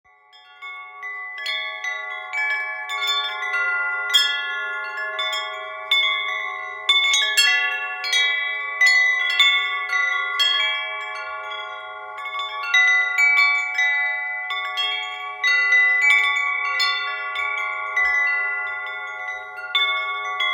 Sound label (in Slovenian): Vetrni zvon solfeggio